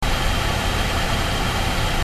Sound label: fan